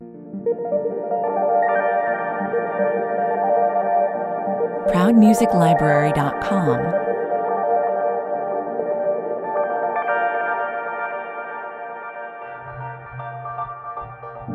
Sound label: 116 bpm